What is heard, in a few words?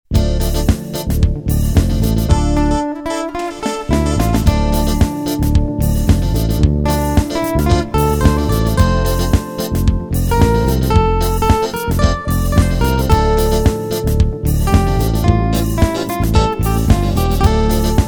Latin Music Instrumentally